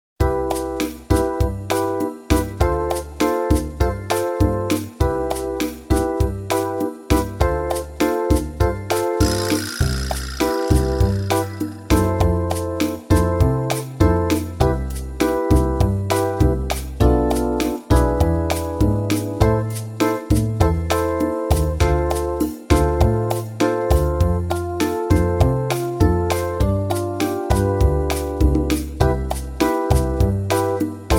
Guitar Basic Bossa Nova Break; Gitaarboek; Go for Guitar

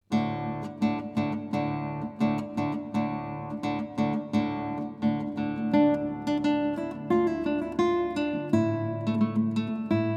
Celtic Medieval March, Gitaarboek

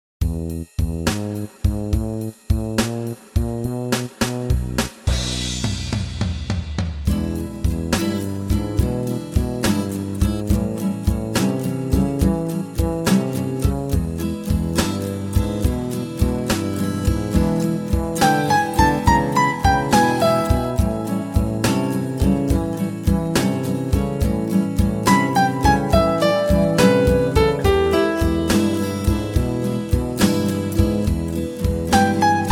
Guitar Basic Blues; Gitaarboek; Go for Guitar

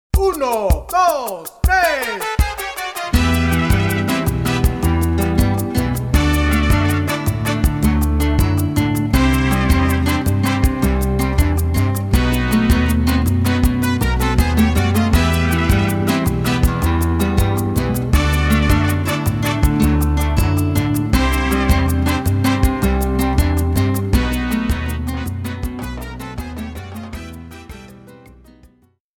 Salsa, Gitaarboek

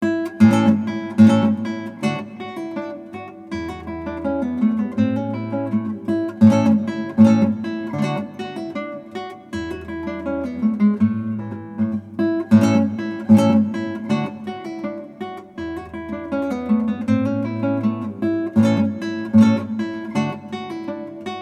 Guitar Recital; Gitaarboek

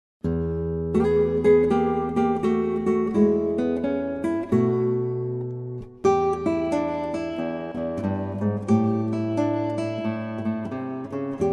Blues, Gitaarboek

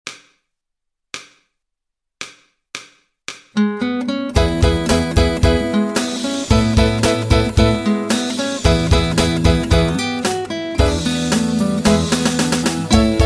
Guitar Shake, Blues Rags and Rock n roll; Gitaarboek

